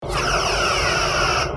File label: other phaser; ships combat; CosmicRage